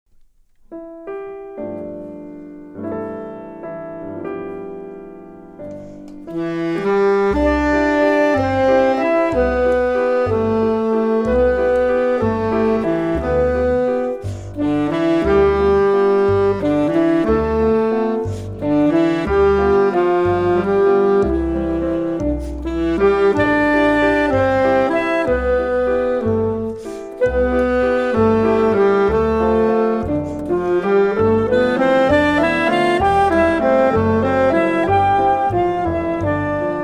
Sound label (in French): Instrumental; Je Te Veux